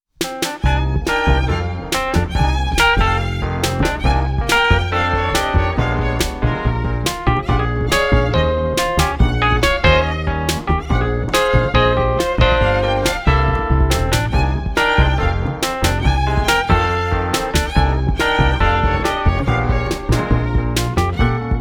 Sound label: Comical Theme, Fiddle